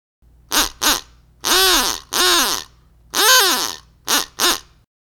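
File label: DUCK, squeaker mouth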